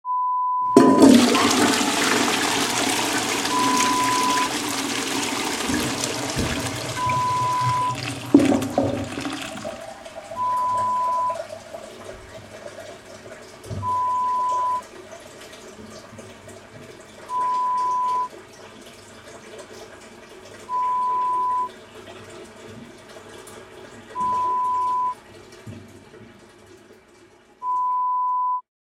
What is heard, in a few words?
Flush sound